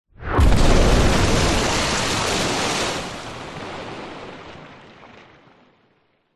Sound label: warfare missile hit water bomb splash large; zapsplat